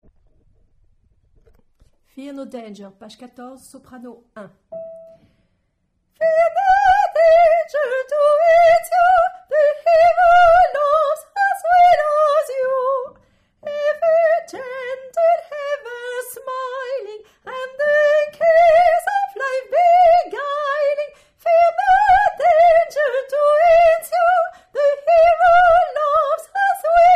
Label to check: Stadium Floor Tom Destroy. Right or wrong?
Wrong